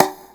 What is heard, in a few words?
perc, Percussion; Trance Explosion Vol1